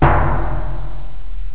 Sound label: tympani, instruments; sonix; Rise Of The Dragon; chipmusic